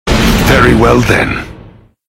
stabbed; theo akatsuki

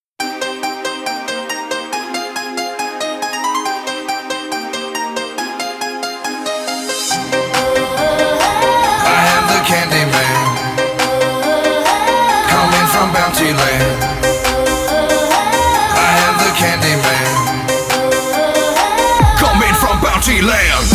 intro music; ff2; candyman

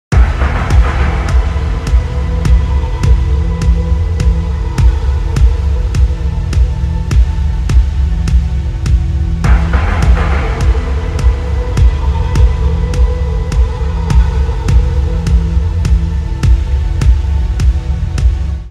intro music; ff2; devil knight

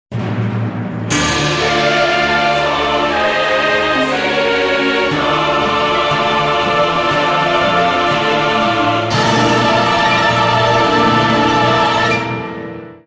intro music; ff2; whitevolcono wizard

